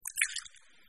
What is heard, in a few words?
break egg; Delicious Emilys Wonder Wedding